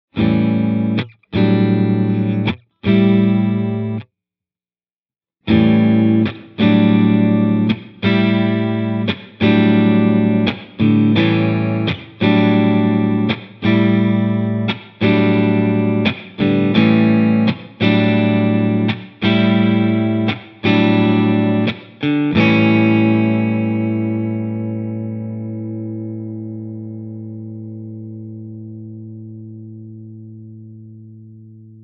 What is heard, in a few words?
Room Studio, reverb; LA Studio